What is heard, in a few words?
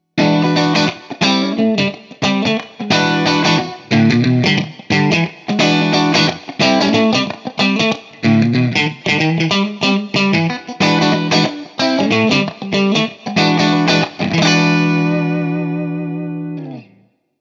Hi Input Bass Shape; Artisan CH1